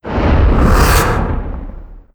portal start

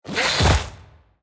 war knockdown

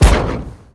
flip poof

